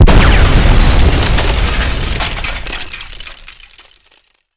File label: generic Explode; BOSS; Bittrip